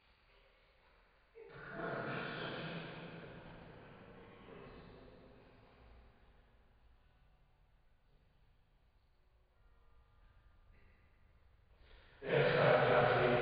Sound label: Choir